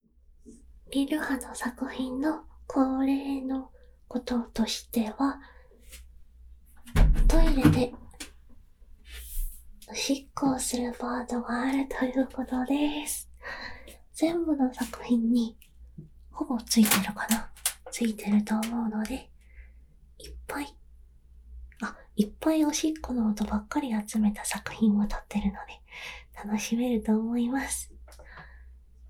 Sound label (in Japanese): おトイレでおしっこ